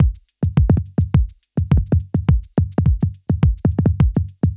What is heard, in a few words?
105 Loose Grooves, Percussion; Loops; Percussion (Mono); Touch Loops; ElementsOne; AbstractRhythms